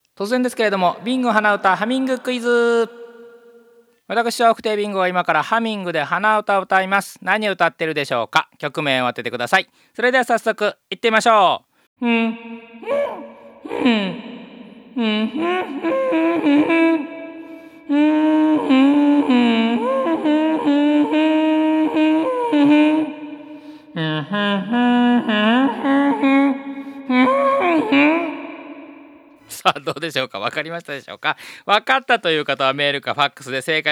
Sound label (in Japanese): hanauta